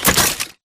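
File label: Tick Movement Footstep Jump; Titanfall 2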